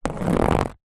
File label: pencil roll on cardboard sheet; office